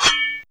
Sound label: Sword, Fairlight CMI Effects